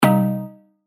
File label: Bass Shot C; synth shots tech house; Primal; revealed